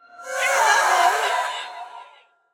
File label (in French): Banshee Cri; Phasmophobia